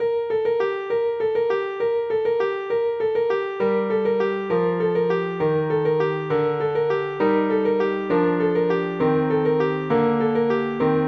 BELLS

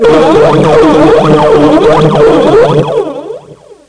glug glug

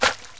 crac; Interactive Media Design Review 1999

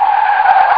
skid

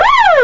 GO SIREN; Carmageddon Demo

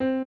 piano

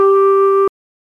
TONE C

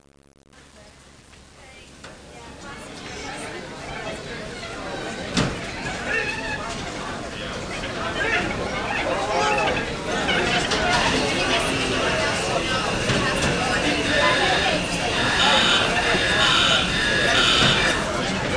baggage, airports; ambient

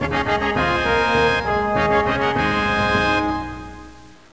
FANFARE; Civilization II

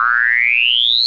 grenade; yoda

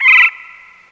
TRAM; NFS3DEMO

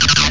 synth; Amiga 8-bit Sampled Voice

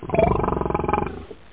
pig; Backbone

